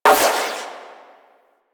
Shot 2D Fire, Weapons Hand Shotgun; Prime Pyrana

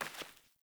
Sandals Walk Vegetation; Characters; Player

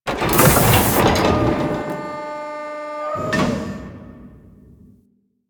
Mover Hands; Con Junction; Warframe; Ambience; Entrati

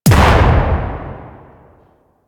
Sniper Shot; DE; Warframe; Tenno; Quad Sniper

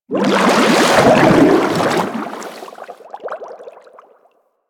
roll whoosh; Warframe; Vehicles; Sharkwing

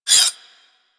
Whip Mech Rotate; Grineer

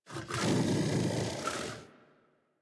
Armored Vocal Breath Walk; Enemies; Kubrow; Warframe

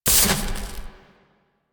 Scrap Container Shutdown; Warframe; Missions; Zariman; Zariman Assassinate

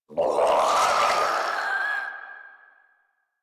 Infested Crawler Death; Warframe

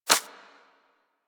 Bird Of Prey Step Dirt; Warframe; Footsteps

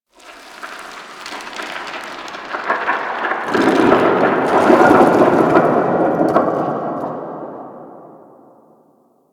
Rock Fall Cliff Distant

